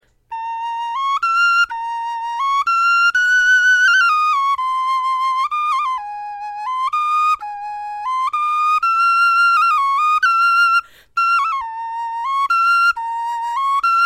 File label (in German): melodie, knochenfloeten